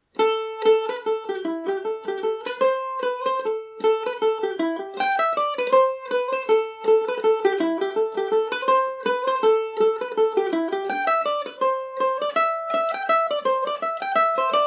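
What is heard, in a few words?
cashmere shawl A dorian, mandolin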